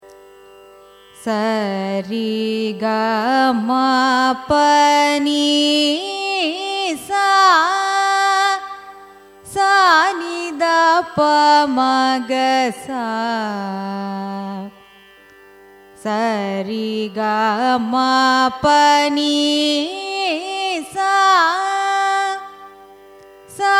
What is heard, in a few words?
raga kannada gowlai arohanam avarohanam; carnatic music